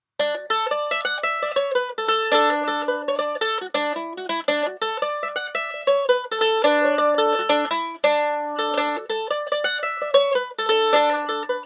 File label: Mandolin Pick Comparison